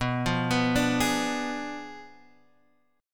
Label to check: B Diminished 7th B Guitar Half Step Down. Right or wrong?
Right